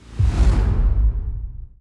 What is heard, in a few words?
god ray fire layer; apocalypse